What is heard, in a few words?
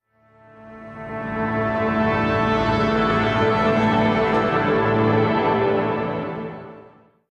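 Orchestral, RingTone; LG GD570AW dLite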